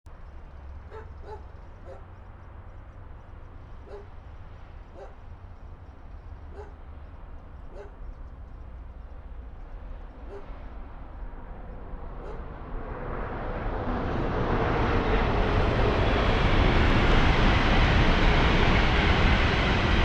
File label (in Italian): Treno; Eigenmike; ComunePR; NuovaStradaF